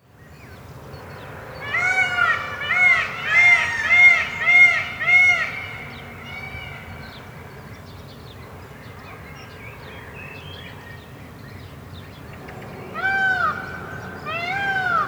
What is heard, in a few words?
Peacocks in Bird Park; افکت صدا حیوانات; Animals